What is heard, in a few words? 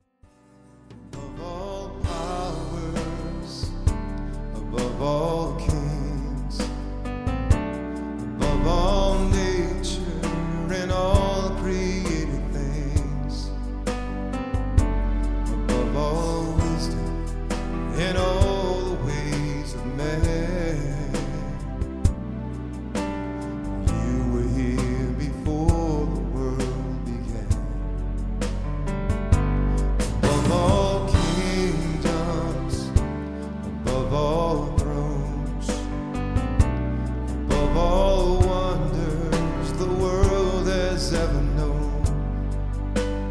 praise mixs, vocal